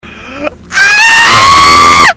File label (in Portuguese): grito; whatsapp